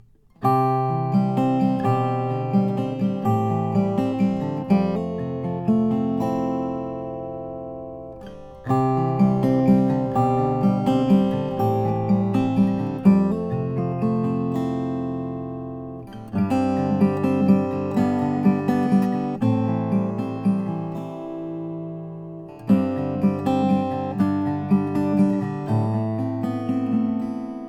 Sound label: bourgeois 00c 12 fret